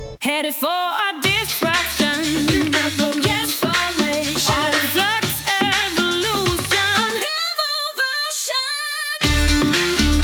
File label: Reggae